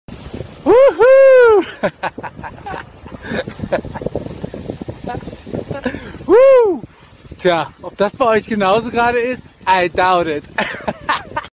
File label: woo hoo